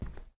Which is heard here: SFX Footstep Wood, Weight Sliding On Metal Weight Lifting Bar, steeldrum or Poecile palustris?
SFX Footstep Wood